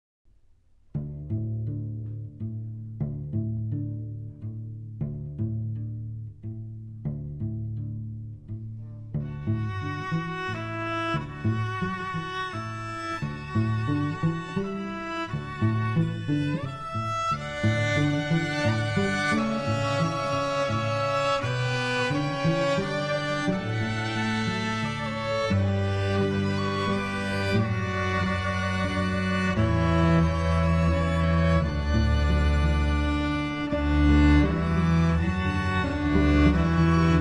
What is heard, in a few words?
French Adv orch